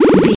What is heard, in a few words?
retro powerup item